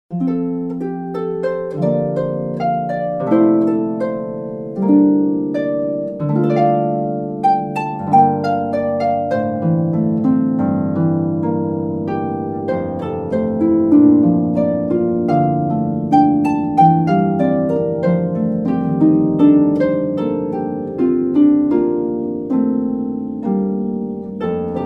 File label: harp; Kari Gardner